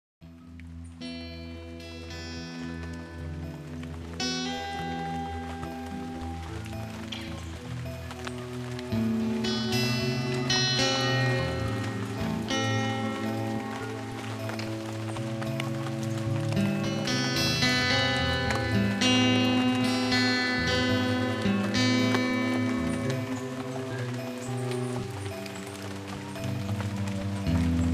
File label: soft rain